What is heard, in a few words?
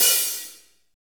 HAT, Roland Fast Rock Hi-Hats